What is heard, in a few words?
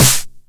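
909 SD Tape, snare drum; Goldbaby Tape808 & Tape909; The Tape 909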